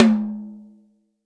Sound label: TOM, Real Drum Kit, FUNK KIT; REAL DRUMS